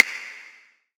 soft hitclap; Obsidian

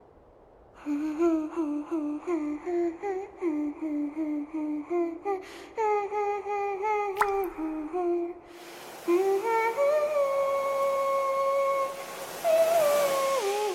applause; Minimal Weeb - Noel v1.3

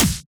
soft hitwhistle, Hitsounds, Clicky; 『Chip 1.1』; Attempts

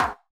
soft hit finish; [EZHD] BlooMoon

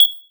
menuhit

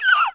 combo break; Charlotte v2 for dt

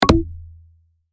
check off; summer pockets (pink)

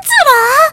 combobreak

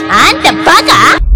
section fail; Asuka Langley

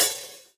drum hit whistle; muni muni! v1.1

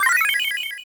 match start; Kill La Kill

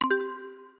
match ready; ⩽ seele ⩾ 【azer】

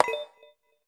pause continue click; BlooXoo

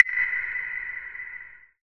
soft hit clap; Karthy v5.6

